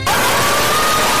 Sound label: scream uwu